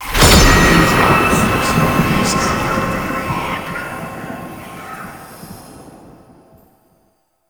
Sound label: Mirror Defense Rune Cluster Spawn; Warframe; Entrati